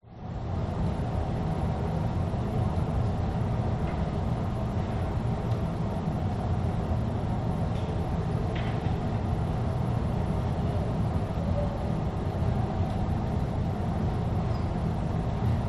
large office room tone; Sound Spark

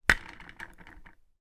office chair leg wheel spin air

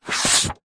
household book artists sketch pad page slide turn; zapsplat

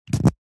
household lip balm tube lid on; zapsplat

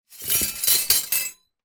household handful cutlery drop carpet floor

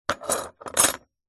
handcuffs metal heavy set down on wood surface

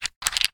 stones pebbles handle; foley; zapsplat

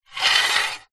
foley tin lid metal thin small slide surface; zapsplat

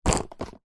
skateboard mini board plastic set down; zapsplat

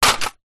impacts metal box hit hammer

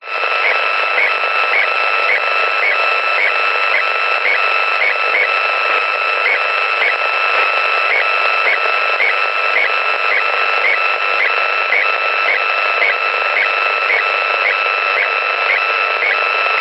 technology radio weak signal clicks buzz static

